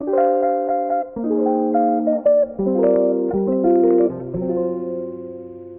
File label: guitar